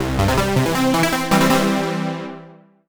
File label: MUSIC EFFECT Solo Warm Synth Positive (stereo); startide macos switch windows gamerip 2017